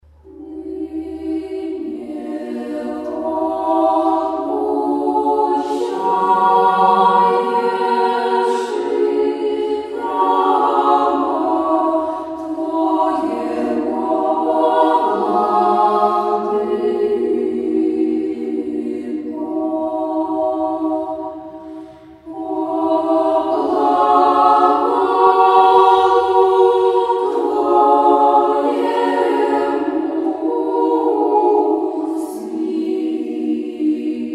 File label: children choir